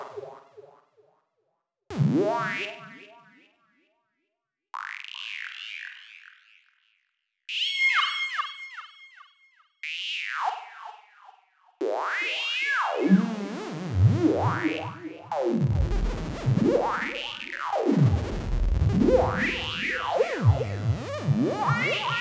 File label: Classic Psy Squelch